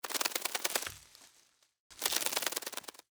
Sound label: Idle Anim Bird